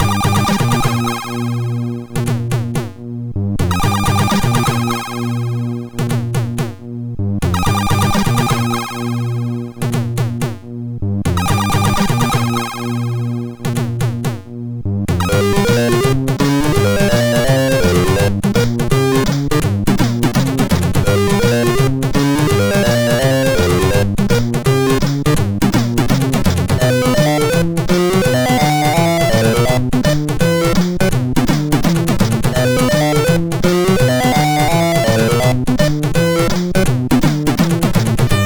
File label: MOS6581R4